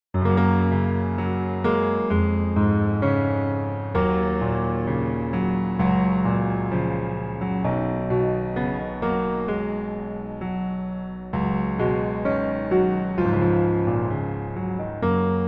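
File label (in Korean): Piano version 멜로디MR 남자키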